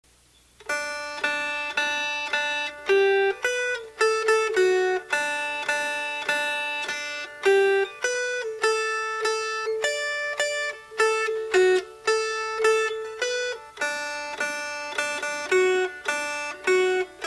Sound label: Pap's Old Billy Goat Melody